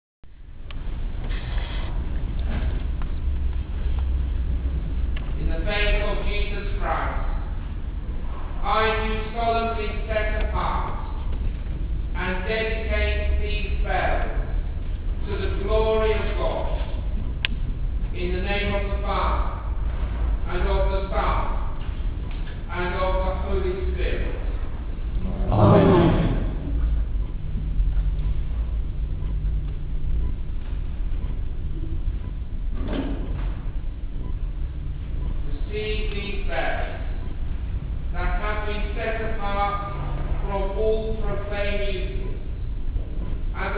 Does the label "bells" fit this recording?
Yes